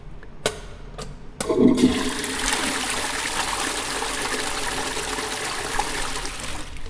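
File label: FLUSHING TOILET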